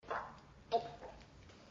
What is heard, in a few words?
Gag; Lewd SFX